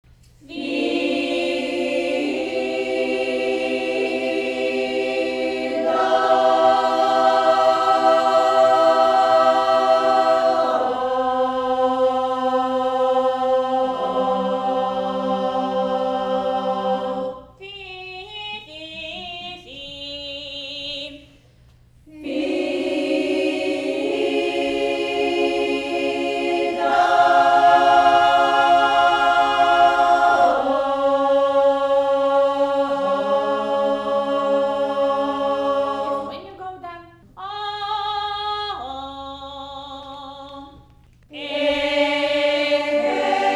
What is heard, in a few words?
chorus